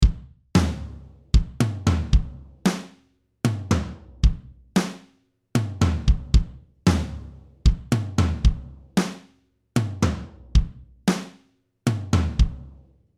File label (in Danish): simpelt guitarspil trommer